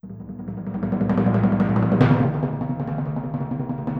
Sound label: Timpani, Orchestral, Rock Pop; Sonic Foundry (Sony Creative Software); Orchestral Series VOL-4 - Rock and Pop